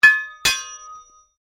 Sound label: Strike Metal On Metal Heavy Ring Double; Foley sounds for melee weapons; Materials